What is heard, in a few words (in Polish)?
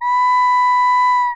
flet szkolny